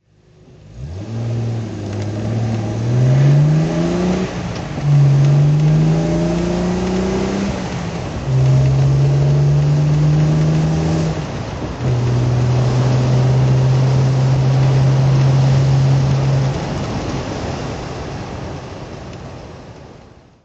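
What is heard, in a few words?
triumph tr6 pi original exhaust drive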